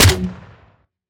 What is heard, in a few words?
rifle Grenade Shot